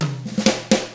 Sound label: 127 SURF ROCK